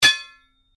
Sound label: Strike Metal On Metal Heavy Ring; Foley sounds for melee weapons; Materials